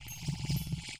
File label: walk; Heller; Mob